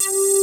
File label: FILTER PLS BS; Pro-Rec - Synthsonic; BASSES